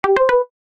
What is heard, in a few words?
Xiaomi Redmi Note 12 Sms; (China)